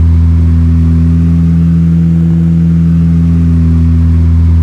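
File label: seat off idle,car engine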